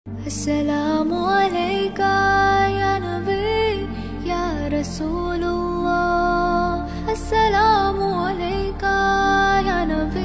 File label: arabic ringtone